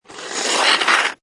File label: Snow And Ice Metal Slides On Ice; Weather